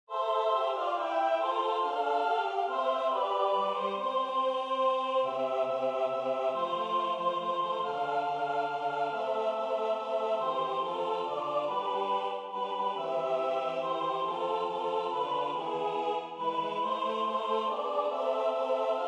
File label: Menuet Tutti; Luly; Armide